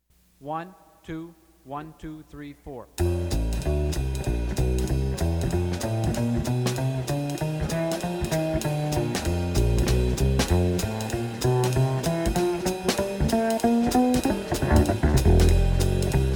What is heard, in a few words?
fast blues in f no piano